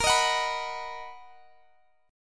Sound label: B Major, guitar chord; B/A#; strummed; x,x,20,20,19,19